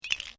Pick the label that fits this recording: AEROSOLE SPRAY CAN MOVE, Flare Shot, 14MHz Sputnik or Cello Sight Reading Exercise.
AEROSOLE SPRAY CAN MOVE